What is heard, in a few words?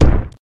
cog stomp; toontown-ceiling